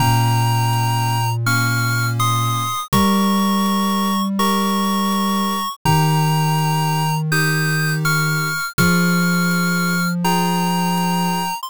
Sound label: bgm mellow